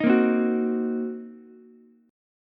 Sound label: C Minor 7th Flat 5th C down Ukulele; strummed; JGuitar